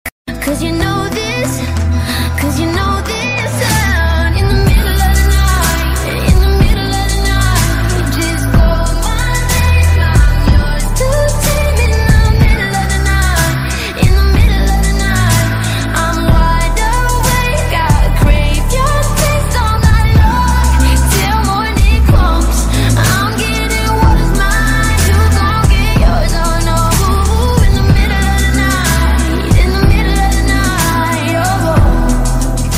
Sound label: ringtone lofi remix ringtone